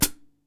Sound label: rich top end hat one shot a sharp key